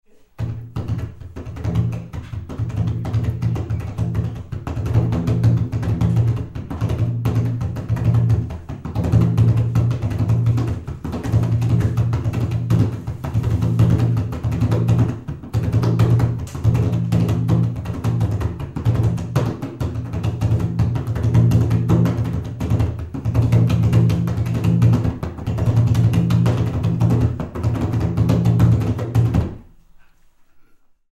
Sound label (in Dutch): drumt snel